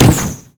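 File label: fireworks missile barrage; Fireworks Corki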